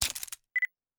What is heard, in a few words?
ui info choose